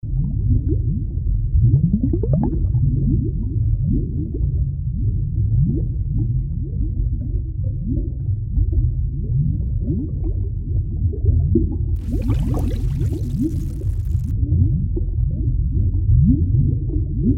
bubble tank bg

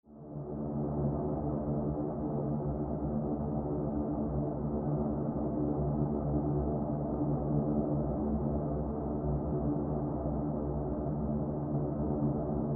flight

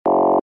wrong

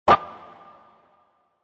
pin

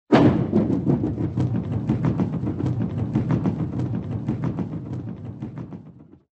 ball Run

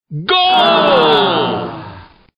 play Sad Goal

